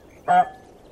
honk glass

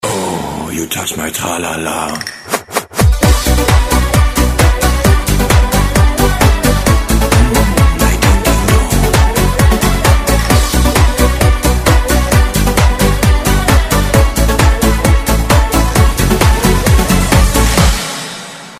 ding ding dong

